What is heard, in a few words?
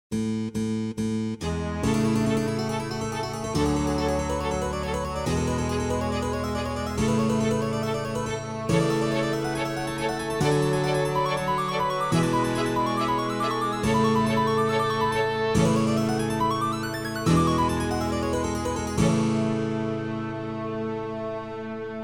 speed picking